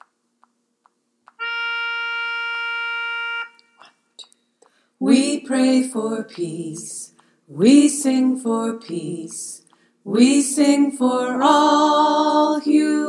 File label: alto, choir